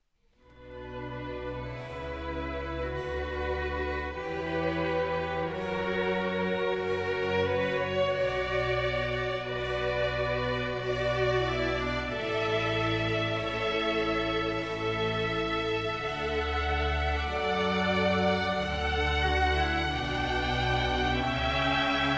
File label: Concerto for Trumpet; Giuseppe Torelli